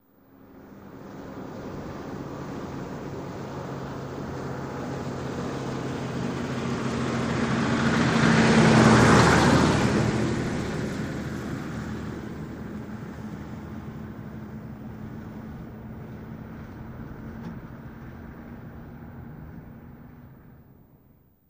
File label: Truck Diesel Slw Driv